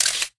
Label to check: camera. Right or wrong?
Right